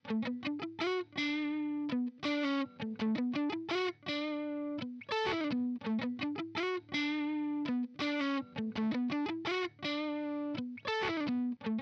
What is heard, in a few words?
Lead